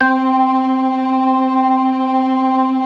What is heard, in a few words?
DRAWBAR VIB, Synth and Organ